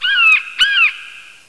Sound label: eagle alert; wanted